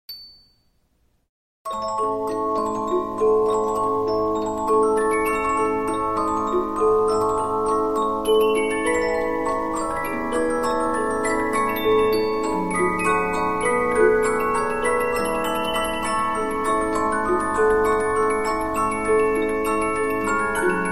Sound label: Polyphon, 15inch disc musicbox